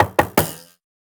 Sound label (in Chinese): 制箭台使用声